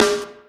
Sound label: snare, drums; virtual drum sounds; custom drums